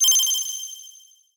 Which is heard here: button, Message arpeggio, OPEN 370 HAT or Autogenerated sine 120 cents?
button